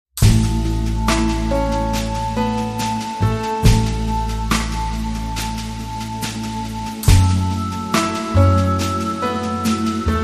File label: No Guitars